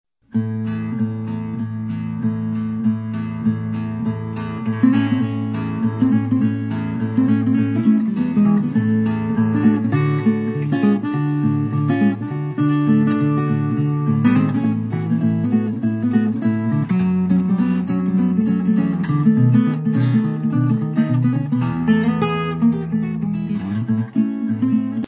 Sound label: Parlor Guitar